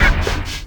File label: Bumpers, Fx; Sonic Foundry (Sony Creative Software); Esoterik Beatz; Bleuy